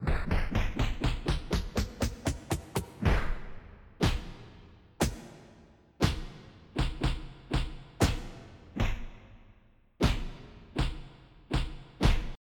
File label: punch; Populous Demo